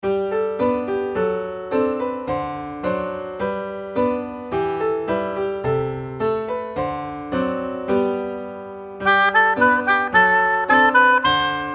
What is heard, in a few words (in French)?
bon tabac hautbois